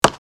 Monopoly board game token single move

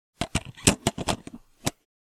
Professional camera attaching the lens

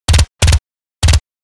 Tactical submachine gun two three round bursts; guns

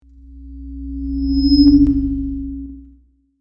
Microphone sting comedy fail

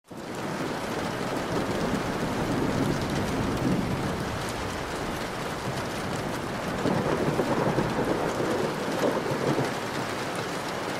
Rain on window sill with distant thunder

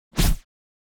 Sci fi forcefield impact energy shield

